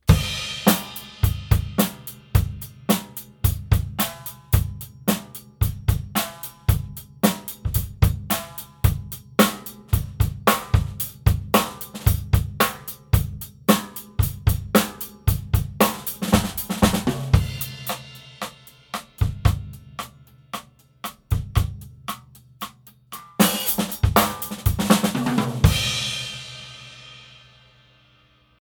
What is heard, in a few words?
drum